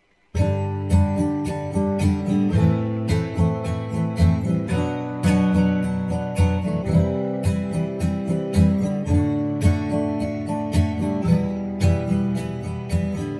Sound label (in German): G Dur Akkorde